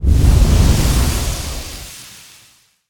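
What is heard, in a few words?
weapon extinguish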